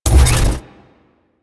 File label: Battery Start; Ambience; Props; Warframe; Orokin Derelict